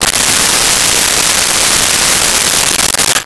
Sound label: Jig Saw; 1001 Sound Effects